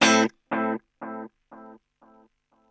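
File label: delay, guitar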